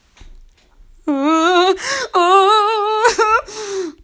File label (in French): Peur